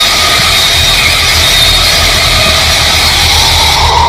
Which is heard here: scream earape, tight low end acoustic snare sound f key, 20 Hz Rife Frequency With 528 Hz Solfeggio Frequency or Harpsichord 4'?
scream earape